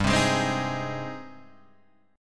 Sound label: Ab Major, G, guitar chord; strummed; Ab/G; JGuitar